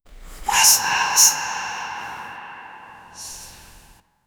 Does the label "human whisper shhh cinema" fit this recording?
Yes